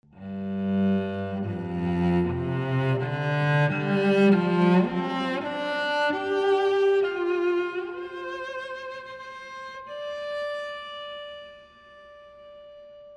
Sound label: Cello, Large Hall; Bricasti